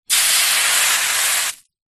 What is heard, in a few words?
household water sizzle dripped on iron hot